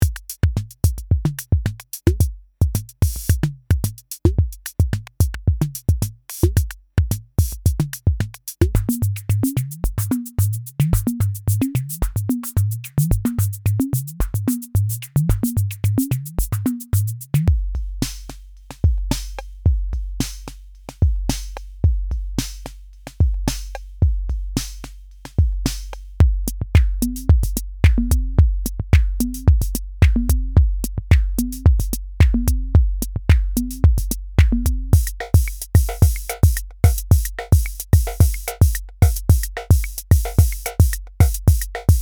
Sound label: Grooves Modulation